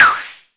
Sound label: Arturia MiniBrute sn